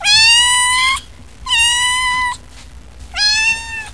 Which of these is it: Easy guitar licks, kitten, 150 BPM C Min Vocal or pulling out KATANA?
kitten